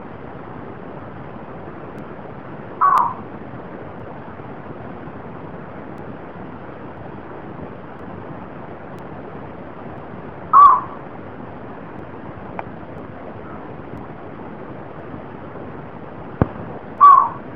rohrdommel

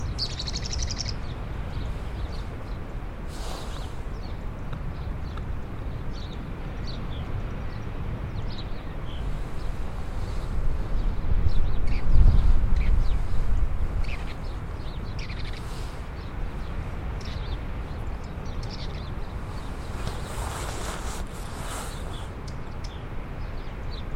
feldschwirl

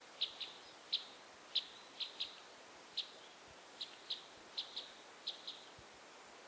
fichtenkreuzschnabel

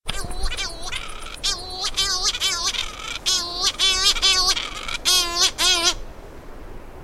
kanarensturmtaucher

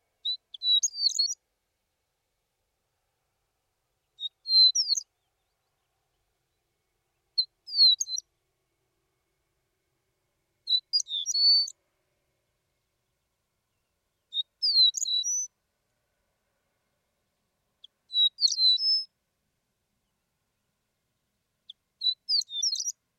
saharaohrenlerche

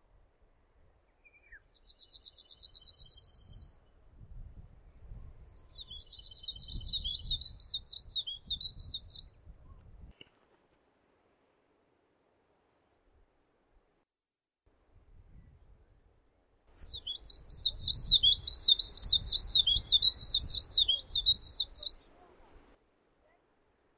taigazilpzalp

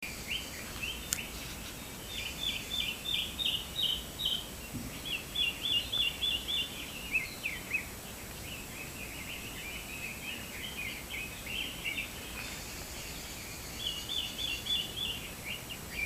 sonnenvogel

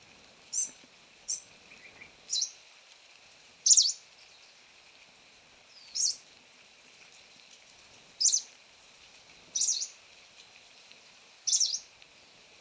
wacholderlaubsaenger

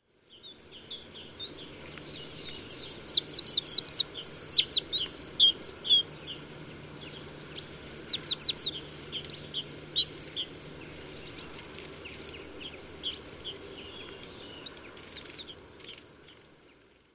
kohlmeise

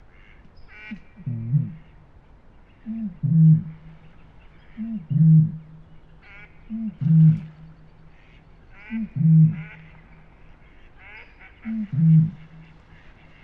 rohrdommel

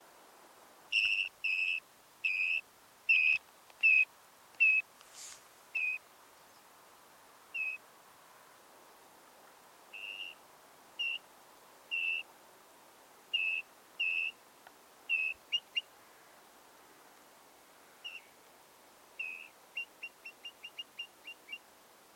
mornellregenpfeifer

